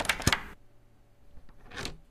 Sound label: lock toggle, machines